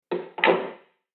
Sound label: door